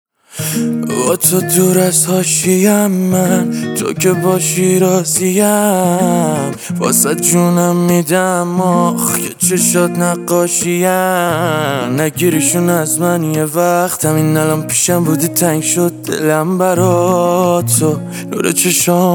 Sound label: Guitar Version